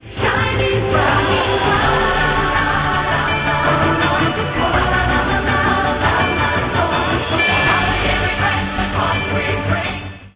ringer; Ringtones

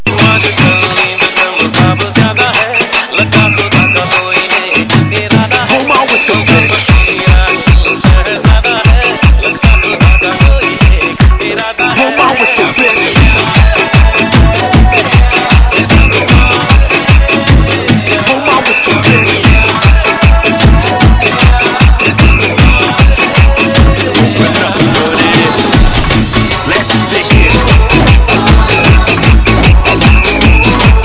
dhol; Ringtones

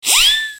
Wizz

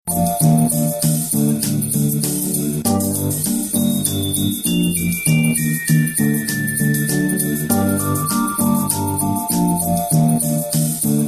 Smooth Chimes

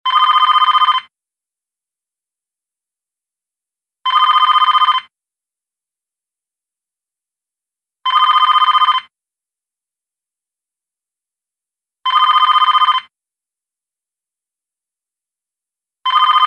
Telethon Ring

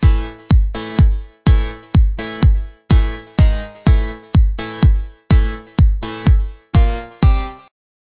ringer; snom3xx; Ringtones

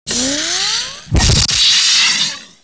gun; Ringtones

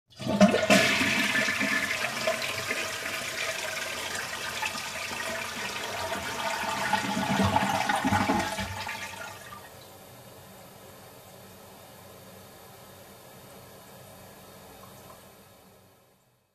toilet flush

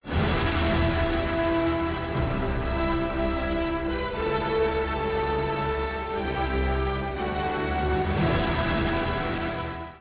ringer; Ringtones

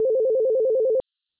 ringer; Ringtones

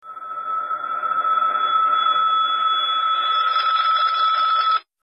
Warped Ring, Ringtones

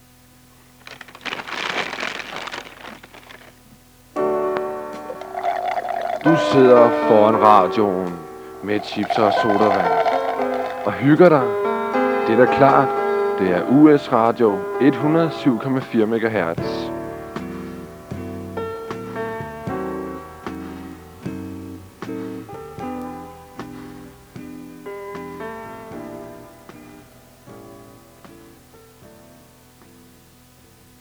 us radio jingle

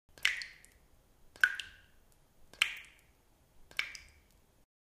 water drops

